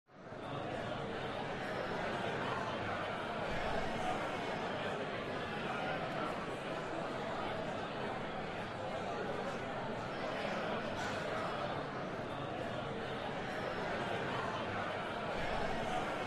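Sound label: Crowd 100 Interior Steady; medium int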